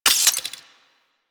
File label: Quill Dart Gun Ice Mech; Warframe